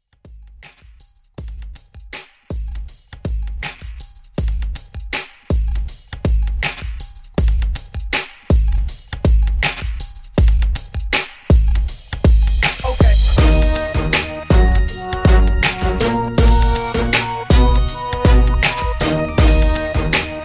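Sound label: Old HipHop with Redrum 160 Bpm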